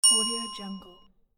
ding; audioheart

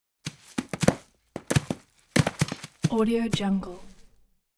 rocks

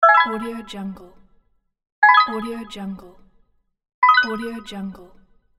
happy coin pick ups; floraphonic

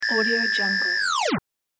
exit from account

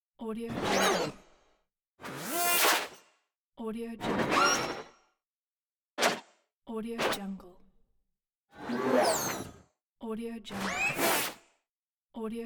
futuristic buttons

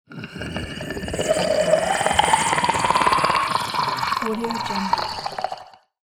zombie growl

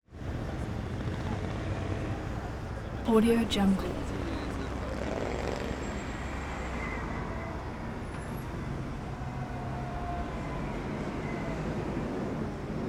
london piccadilly circus pedestrians

